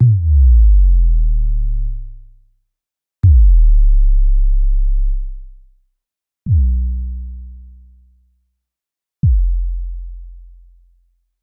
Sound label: sub bass drops 4 variation; PickupSound